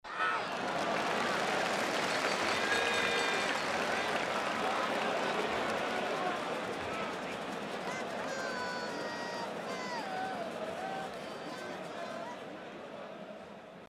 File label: crowd unhappy